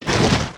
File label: drag